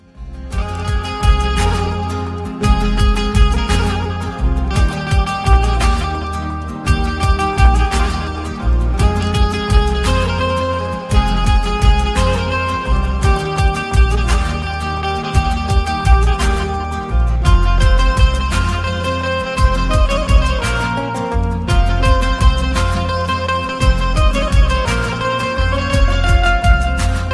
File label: bollywood sad instrumental ringtone